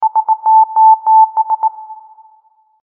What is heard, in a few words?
samsung mms ringtone